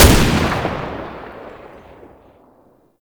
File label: Belt Fed Rifle Shot B; Weapons; Warframe; Tenno; Frumentarius